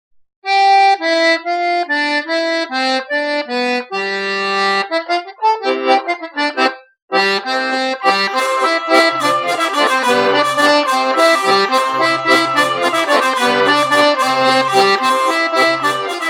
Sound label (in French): bourree, france, auvergne